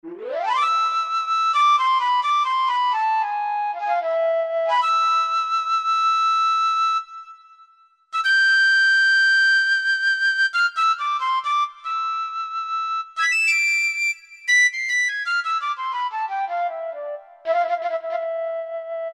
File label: Picc, Yamaha TG500